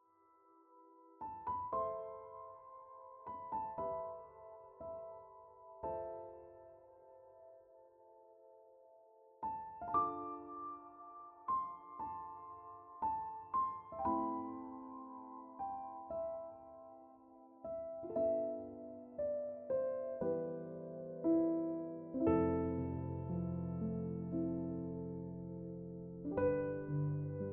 Ringtone Piano Music

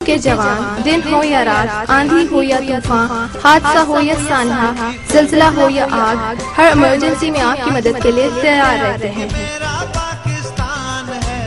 Rescue 1122 Pakistani Ringtone

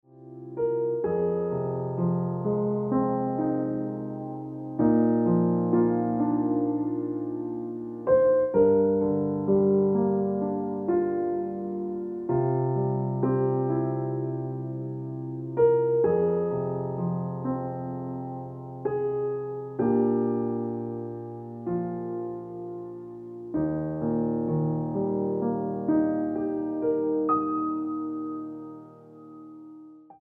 Ringtone

